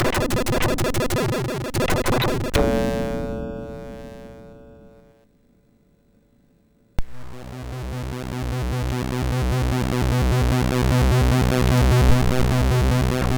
An SFX Song sid MOS6581R4

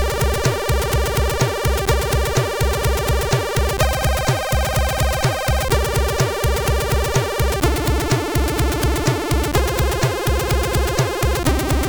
Rain, MOS6581R4

